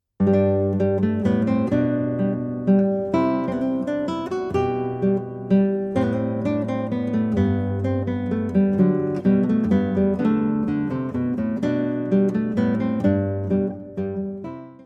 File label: menuet